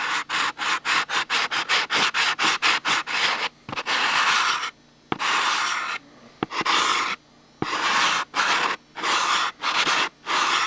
Sandpaper

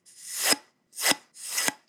Unsheath Arrow

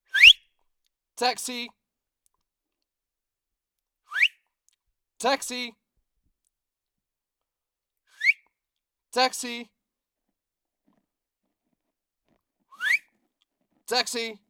Taxi Call

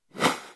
Passby

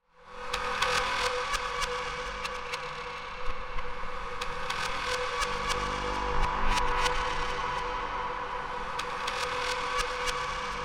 Insect Synth

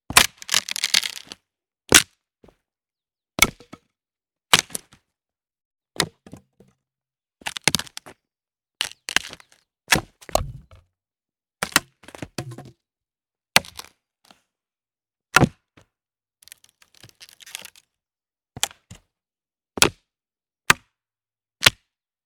Cracking dry wood

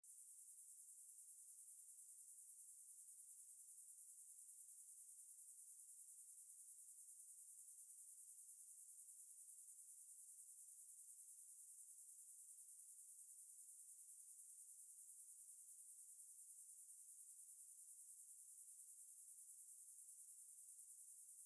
Dem Dank Crickets

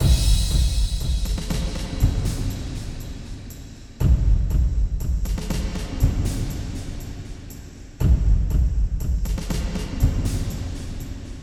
Cinematic Drum Loop 120bpm

